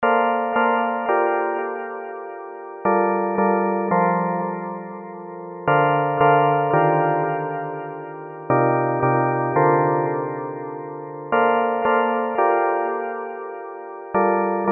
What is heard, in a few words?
digital kalimba; bluewave by music sound software